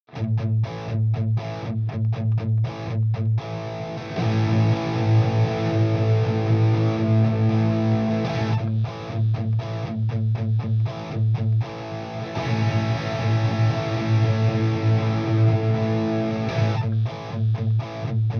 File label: comp test comp off